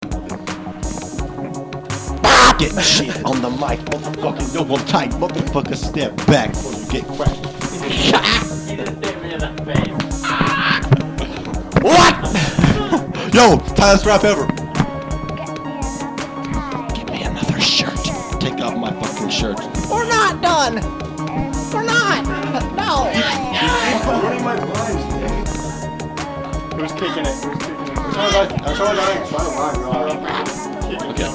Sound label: terrible rap